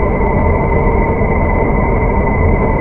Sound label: warp engine; Earth and Beyond; EA Games